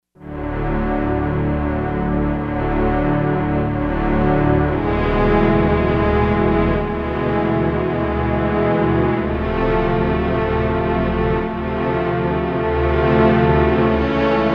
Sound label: Strings; Orchestra 1